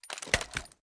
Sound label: ar reload empty end; weapons; gmod; viper